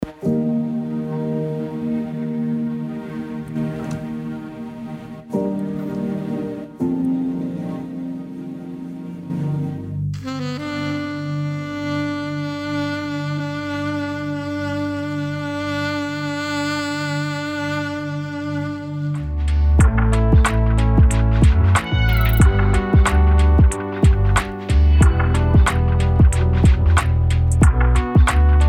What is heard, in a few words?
Music for Spa and Wellness